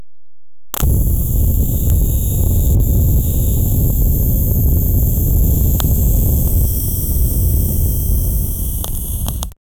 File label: fire cannon, battlefield